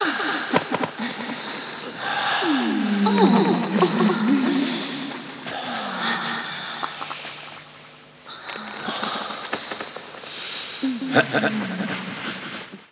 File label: Ambiences, Places and Ambience